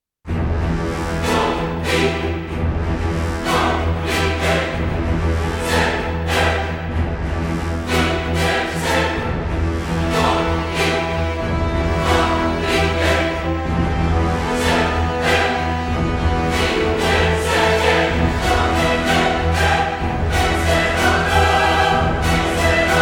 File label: Orchestra And Choir Only, Epic Choral Action; трейлерная музыка